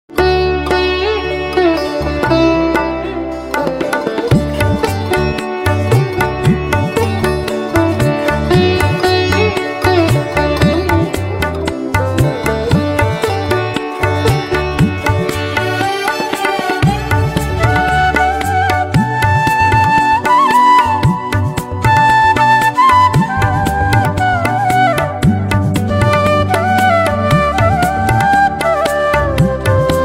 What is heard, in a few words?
Veena with Flute